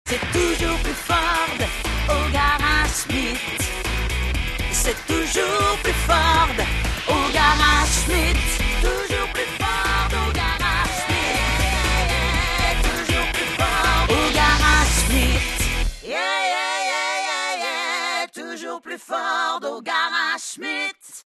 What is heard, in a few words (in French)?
choriste